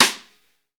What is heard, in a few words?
SNR, Snare; Club-50 - Foundations Roland; Ext.Snare 3